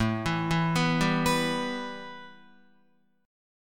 A 7th Suspended 2nd A, Guitar; arpeggiated; guitar chord

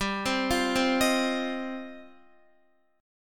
G 7th Suspended 4th Sharp 5th, Guitar; Listen to G7sus4#5 arpeggiated; G7sus4#5 Chord

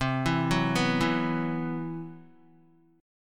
C Major 7th Flat 5th down Guitar

